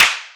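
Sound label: clap, Boss DR-202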